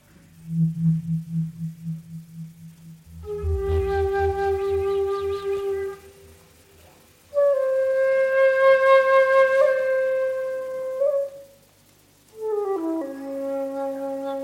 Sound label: (Live); Live At Club Arc En Ciel, Roanne, France 1973